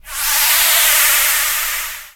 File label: NOIS; XXL 800 Production Effects